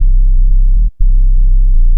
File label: Acid 120 Bpm